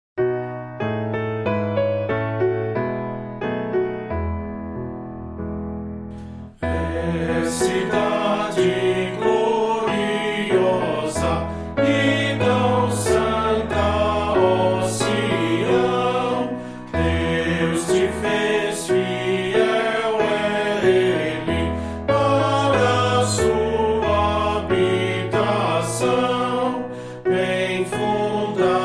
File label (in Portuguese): voz piano